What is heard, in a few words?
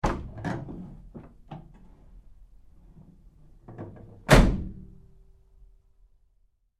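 Truck GMC Savana Door 2nd Side Open Close Medium; 2010 GMC Savana; Trucks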